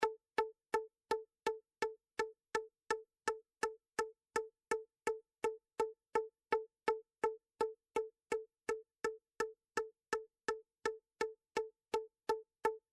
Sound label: Pizz